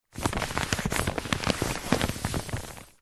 paper sheet on soft surface hand rub over crumple rustle; foley